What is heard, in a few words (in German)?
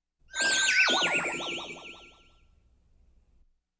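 elektronen beschuss; Geraeusche; Science Fiction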